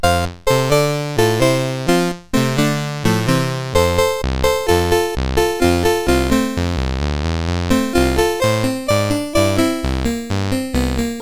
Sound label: Polka, CSG8580R5